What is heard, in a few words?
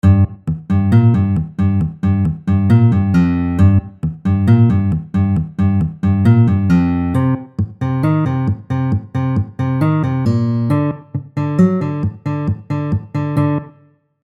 riff